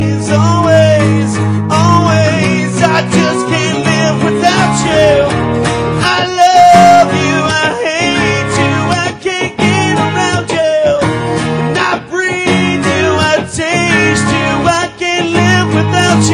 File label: acoustic